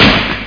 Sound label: ar shot; matrixhl; weapons